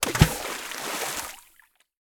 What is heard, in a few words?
foley impact stone water; clean field